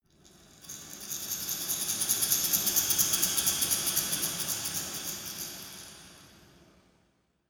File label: dark resonator rattle dynamic